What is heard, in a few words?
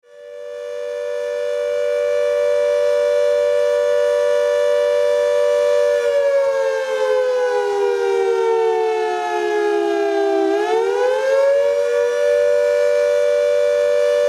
Air raid siren